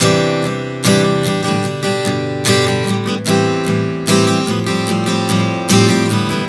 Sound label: Progression 074 C-G-Am-F, Acoustic Guitar, Chord Progressions 074 bpm; Sonic Foundry; Songwriter Acoustic Guitar Companion